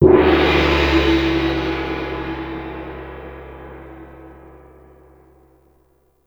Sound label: Dojo Gong; Warframe; Dojo Props; Gongs; Tenno